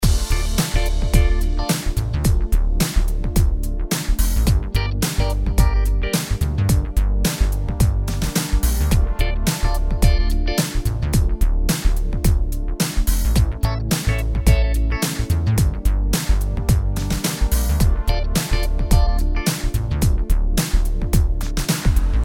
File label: Cool Menu Loop